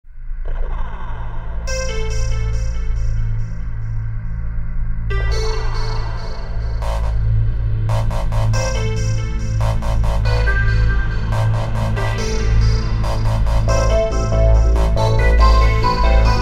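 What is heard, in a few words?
lead soft explosion; xtremelead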